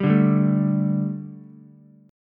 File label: A 7th Suspended 4th, A, down, Ukulele; A7sus4; strummed; JGuitar